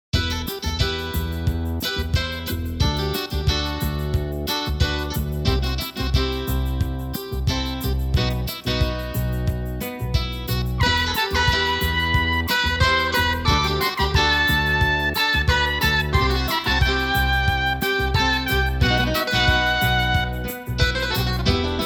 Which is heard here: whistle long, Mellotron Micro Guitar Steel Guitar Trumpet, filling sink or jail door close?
Mellotron Micro Guitar Steel Guitar Trumpet